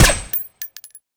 uzi fire, weapons

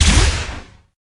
crylink fire, weapons

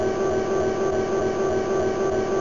medplat, plats

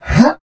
jump

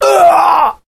death

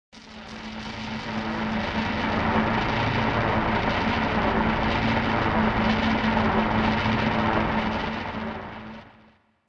air, ambient

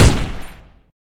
uzi fire, weapons

